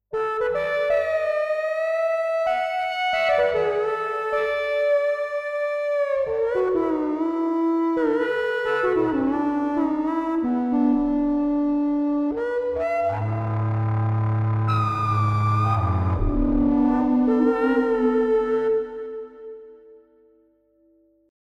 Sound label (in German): Uhrzeitfloete